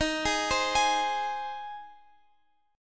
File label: G sharp Major D sharp